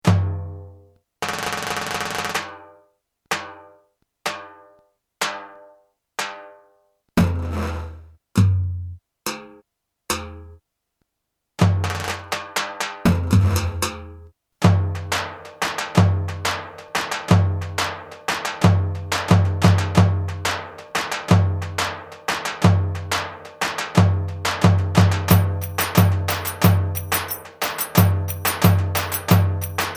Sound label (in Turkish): davul, roland bk 5 asma davul